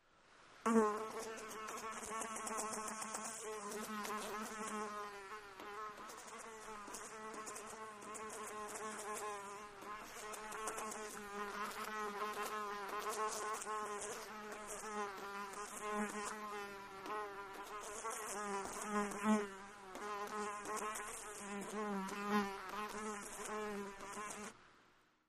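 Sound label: Fly Buzzes Against Window; flies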